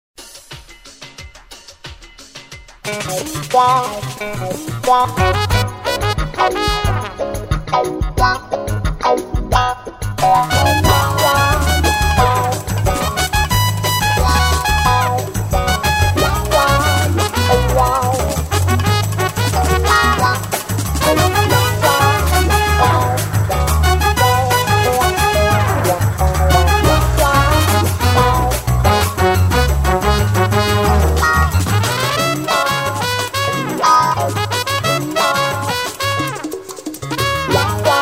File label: Colombian Funk; Boiling Point Promo